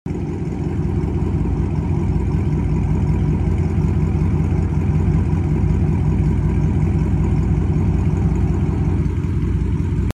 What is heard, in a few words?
chrysler